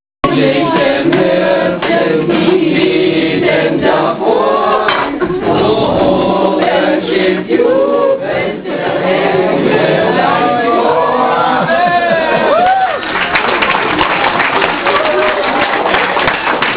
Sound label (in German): klingelton